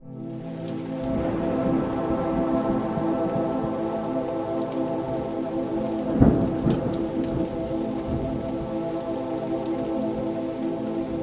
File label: Lumina, ambient pad